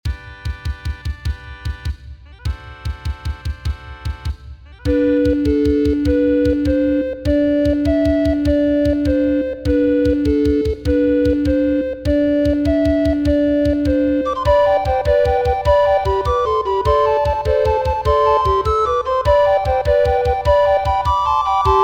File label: Irish Washerwoman no Bass